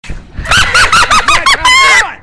laugh track

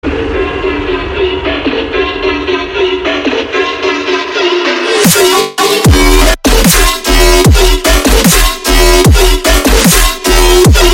metal style

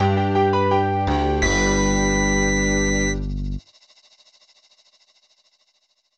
t mobile midi startup

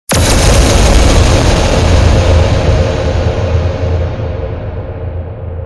reverbing lasers

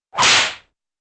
whippy whoosh

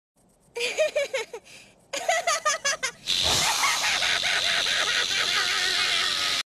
crazy laughing; penny fitzgerald

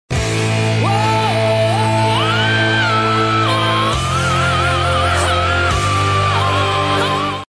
woah oh oh ah ah ah aaaa ha ah ah ah

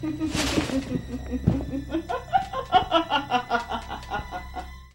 laughter

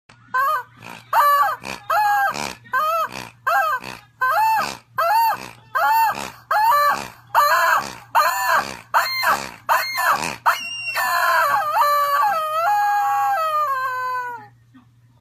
chicken pig

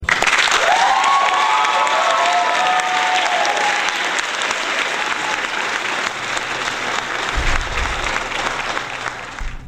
applause

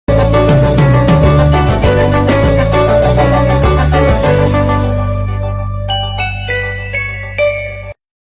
chill music

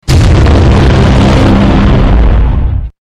distortion; inception

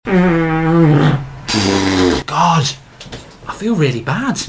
fart

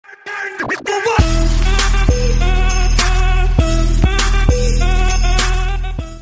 music drop

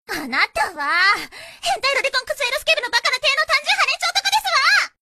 anime mad

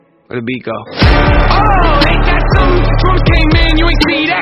beat drop

